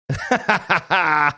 funny laugh

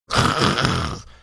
making explosion sounds

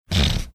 snorting

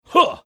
"huh"

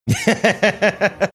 laughing

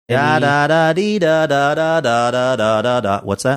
singing series of notes saying whats that

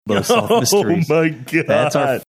laughing saying oh my god

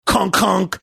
conk conk

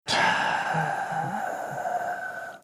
long sigh

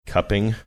cupping

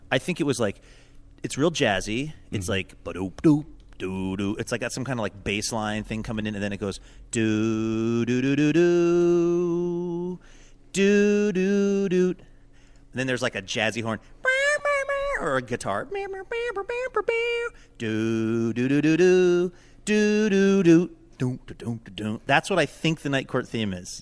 sings theme; night court

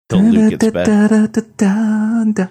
singing portion mystery song

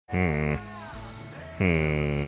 hmm hmm

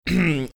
clearing his throat

